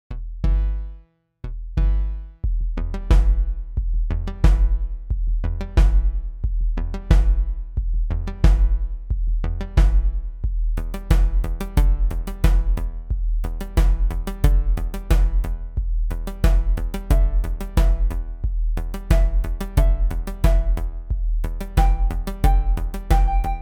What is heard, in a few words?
Dance; MiSide